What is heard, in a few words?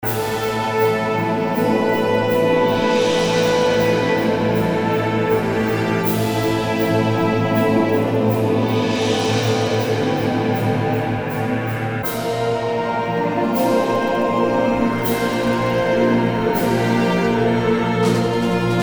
movie score